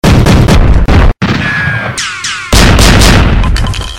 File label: Gun Sms